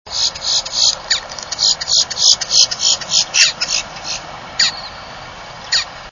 grackles boat tailed male with beak clap, Boat-tailed Grackles, male